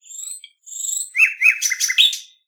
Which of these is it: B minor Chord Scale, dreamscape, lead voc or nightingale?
nightingale